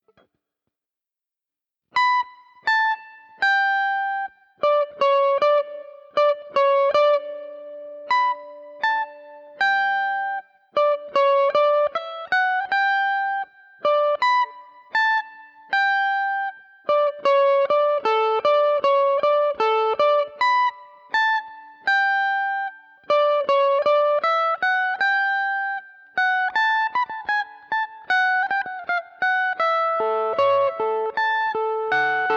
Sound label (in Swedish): Hopska halvtempo